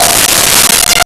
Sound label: Celeste F5; Nintendo; July 2020 Gigaleak